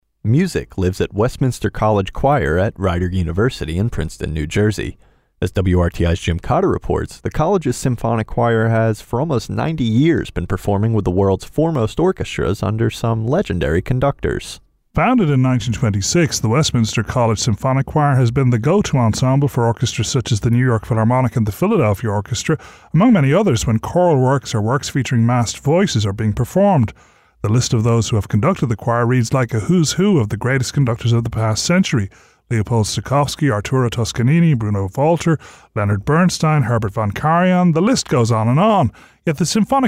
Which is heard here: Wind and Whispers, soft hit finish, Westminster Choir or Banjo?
Westminster Choir